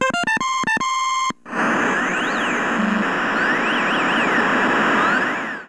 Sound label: Intellivision fanfare